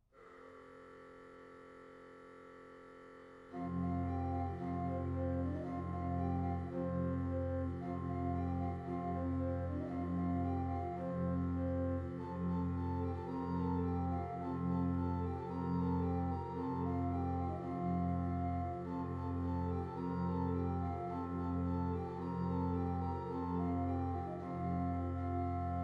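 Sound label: Ungarescha, organ; Clicquot; Paix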